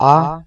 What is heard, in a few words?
ah male realization; Voice; ooh ahh